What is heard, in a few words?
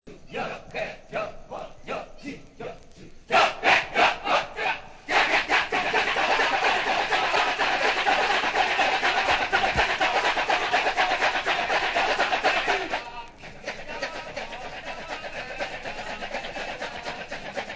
Ketjak Bali